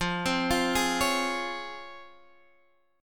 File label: C sharp Major 7th